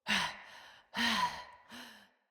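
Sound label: Cry Heavy Walk Female; Battle Barks; Envoy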